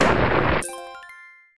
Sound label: hammer hit rock explode right answer